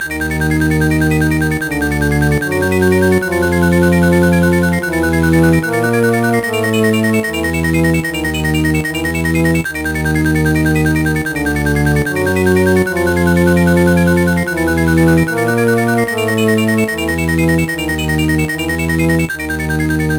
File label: Castle BGM; SMA4; SMB